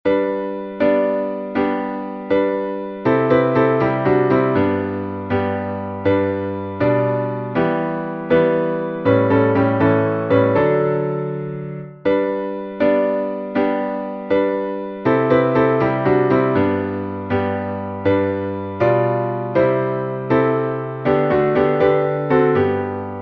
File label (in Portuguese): salmo instrumental